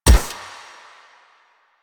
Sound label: Shot Mech; Warframe; Akarius Prime